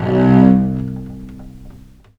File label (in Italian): C1, Historical Instruments, VIOLE MUT; USB Soundscan vol.23; AKAI